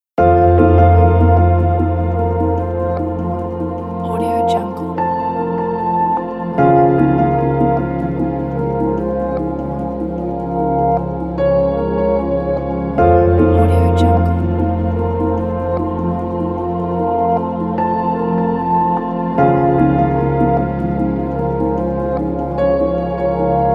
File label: inspiring ambient